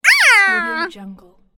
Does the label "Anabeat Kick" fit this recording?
No